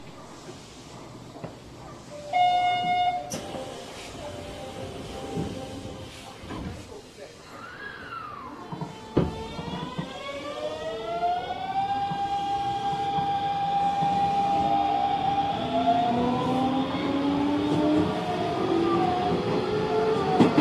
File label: vvvf, mitsubishi; hansin 5617